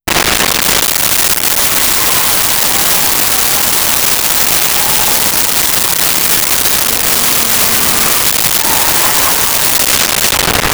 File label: Skid And Peel Away; Vehicles; Cars & Trucks